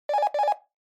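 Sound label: Handheld Transceiver Signal Transmit Call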